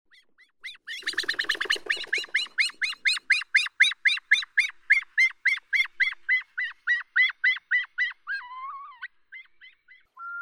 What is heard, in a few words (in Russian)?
ular altaiskii tetraogallus altaicus, zvuki prirodi